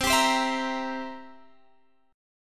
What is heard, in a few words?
B sharp 7th B sharp; B#7; strummed